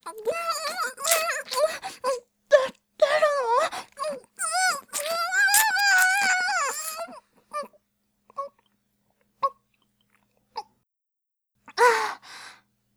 ごっくん; 幼馴染上位

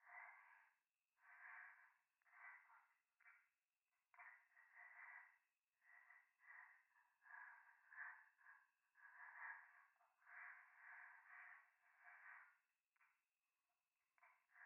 右耳 快感 吐息 山奥 夜; 夢の中

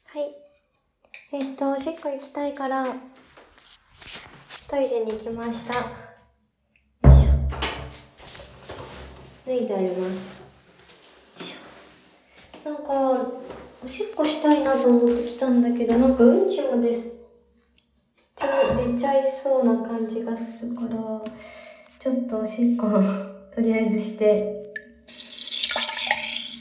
おトイレで！オシッコですッ！; ●●●出ちゃった？！

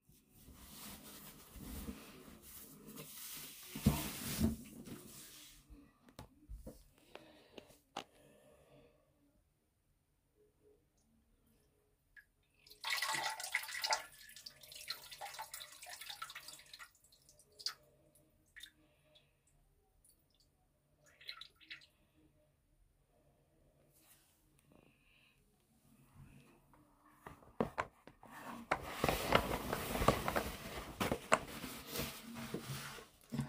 収録後の おトイレで オシッコですッ

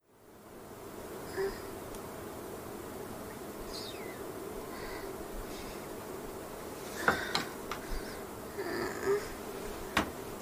発育途中の女子校生 発情期オナニーの喘ぎ声

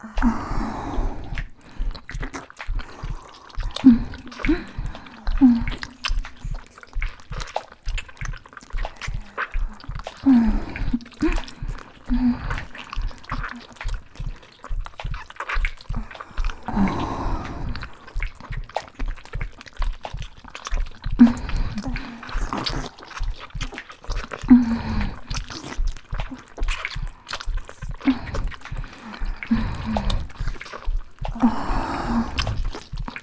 両乳首舐め; 抱き着きキス騎乗位搾精

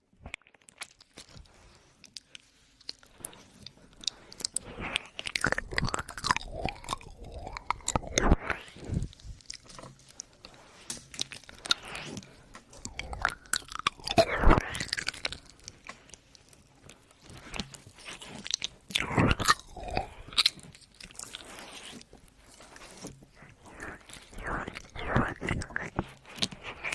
お姉さんの唾液ビッチョビチョで全身とろけるような快楽耳舐めボイス

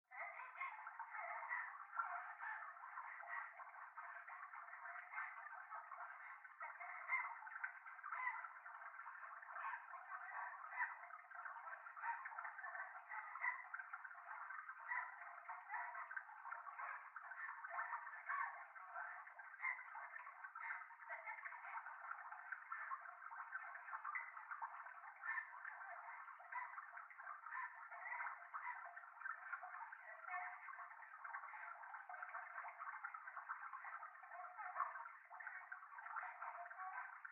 トイレ 喘ぎ 川 の せせらぎ

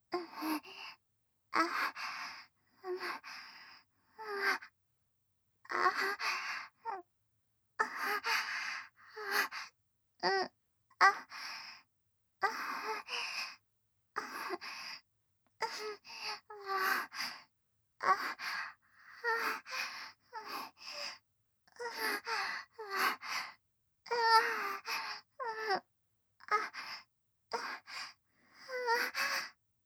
喘ぎ

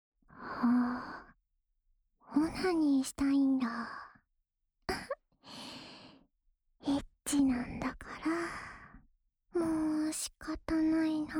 耳舐めループ（右耳）, ASMR; オナサポ用

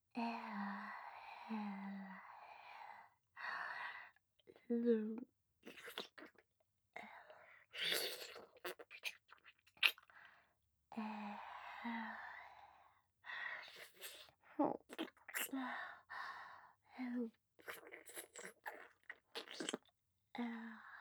乳首舐め(ゆっくり); 乳首舐めループ

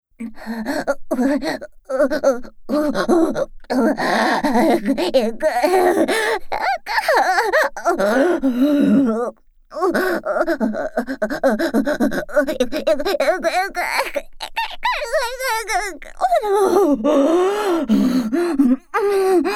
ご奉仕も忘れて無化夢中オホ声ループトラック, ASMR

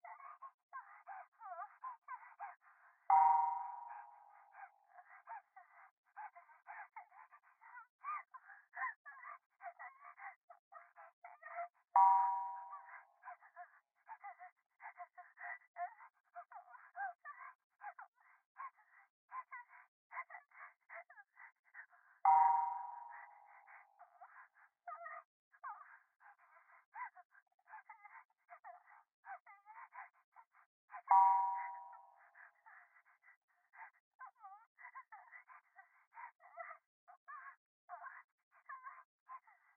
電話 可愛い喘ぎ 寺, ASMR

